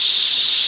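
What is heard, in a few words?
Firework; ps1 windows; Buggy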